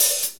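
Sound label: hi hat; HH-Kits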